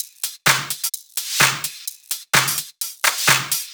House loops 128BPM; Vengeance Samples

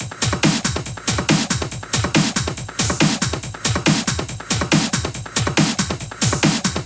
140BPM Genetic Kit

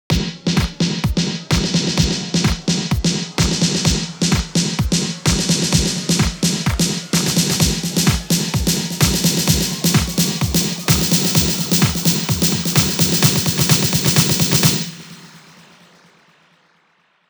Big Fill Ins 128BPM; Vengeance Samples

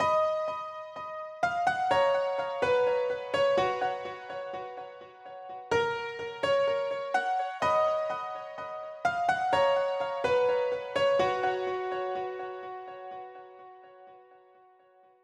126BPM Melody; Vengeance Samples; Netherworld Kit

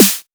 snares; Vengeance Samples

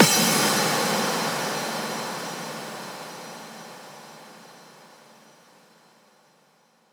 Bassdrums FX, FX Kicks; Vengeance Samples

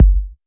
Bassdrum; Vengeance Samples

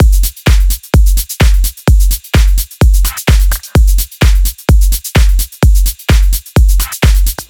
128BPM Drums; Vengeance Samples; Beatdancer Kit

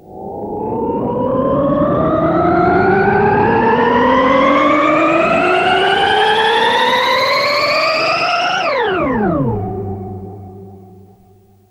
Sweeps Long

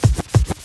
Vinyl FX and Scratches, FX Scratch

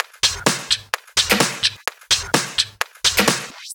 House Loop, 128 BPM; Vengeance Samples

